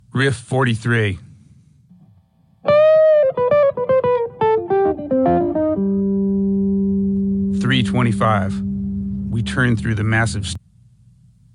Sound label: Riff